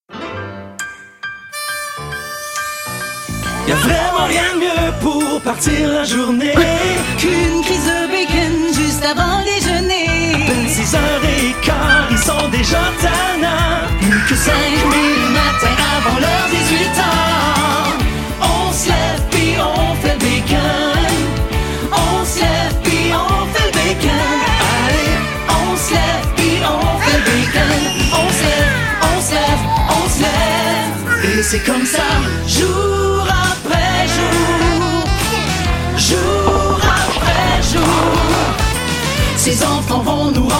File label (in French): pub chantee